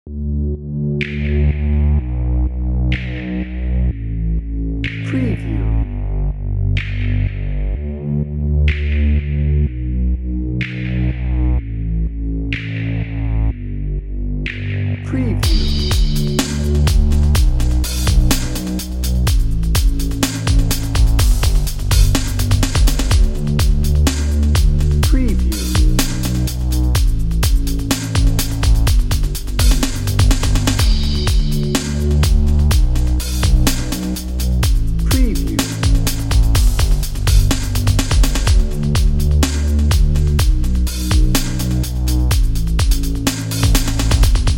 dreamy dancer dnb

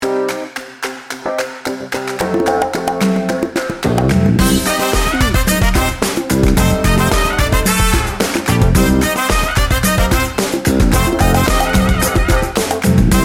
funky bones

